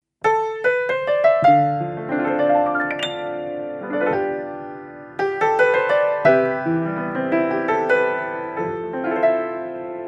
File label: piano